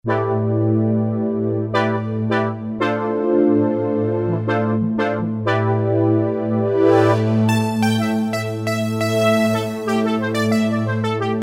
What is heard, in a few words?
VCOs and As; Roland SRX-03 Studio SRX